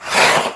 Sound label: alligator attack